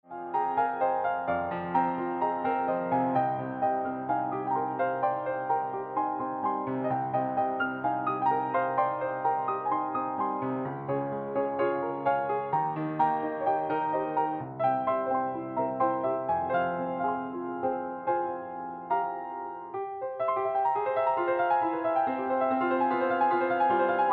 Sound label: piano